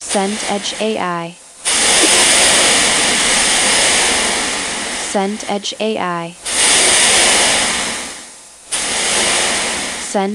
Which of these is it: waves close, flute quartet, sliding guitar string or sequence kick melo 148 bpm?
waves close